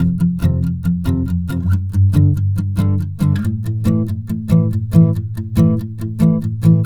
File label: Acoustic Pop Guitar 140BPM Amin; Acoustic Pop Guitars; Vengeance; Essential Clubsounds Vol.4; Guitarloops